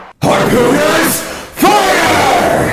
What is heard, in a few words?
harpooners fire